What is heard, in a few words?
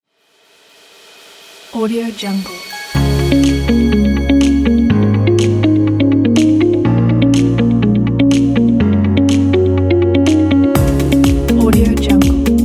clean inspiration